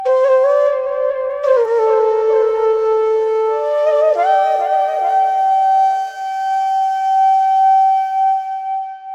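G MIN FLUTE, Organic House / Deep House Sample Pack Oriental & Middle Eastern; Azura – Organic House Sample Pack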